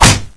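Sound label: fist hit body; dn3d r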